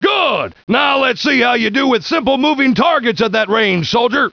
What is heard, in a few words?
rifle